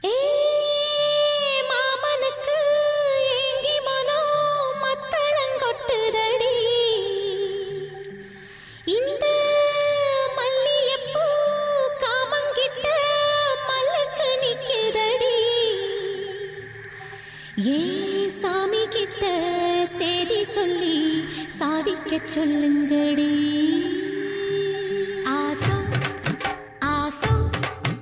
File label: Tamil Gana